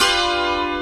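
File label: ZIMBAL PIZZI; Best Service; World Colours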